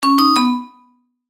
Multimedia Positive, Technology, Multimedia, Positive, Element; Alert; Alerts; BlastWave